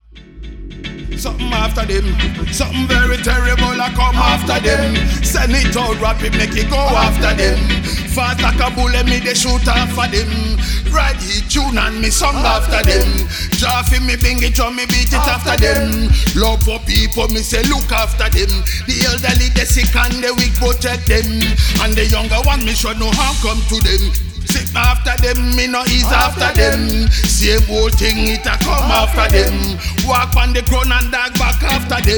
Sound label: Dub